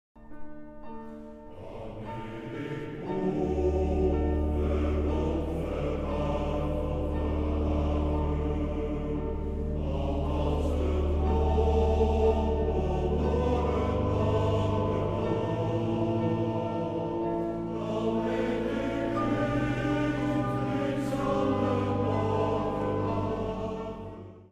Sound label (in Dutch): Hoog boven alle vragen, mannenkoor